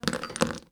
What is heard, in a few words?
game Dice; Singles Flirt Up Your Life